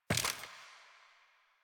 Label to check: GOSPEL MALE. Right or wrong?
Wrong